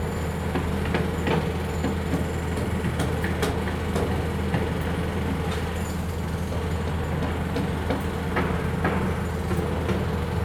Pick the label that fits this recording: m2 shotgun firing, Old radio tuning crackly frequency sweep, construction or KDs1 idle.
construction